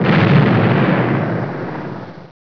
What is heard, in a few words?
explosion